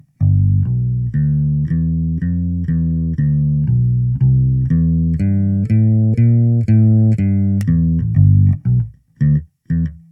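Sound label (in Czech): Bezpražcová baskytara Cort B4FL - experimentální simulace s použitím vzorku pro kontrabas (jen jedna ukázka); NUX NPB-5 Melvin Lee Davis Bass Preamp & D.I. Box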